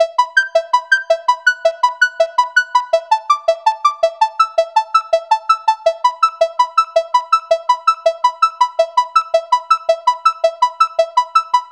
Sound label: Intro Arpeggio